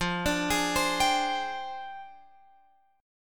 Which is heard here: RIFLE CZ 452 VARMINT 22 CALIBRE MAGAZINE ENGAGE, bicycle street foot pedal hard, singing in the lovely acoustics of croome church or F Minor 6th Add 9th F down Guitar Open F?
F Minor 6th Add 9th F down Guitar Open F